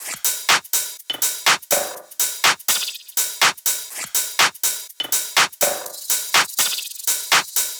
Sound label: tops 123, Drum Sample Pack Loops Top Loops; SD SEISMIC; Slate